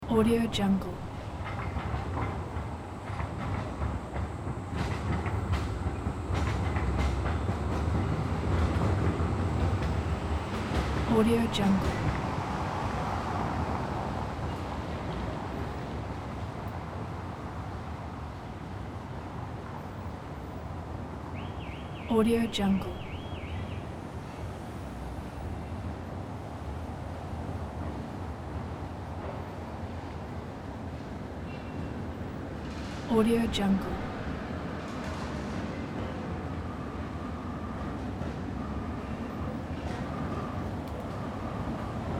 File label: urban city transportation tram old rails pass bys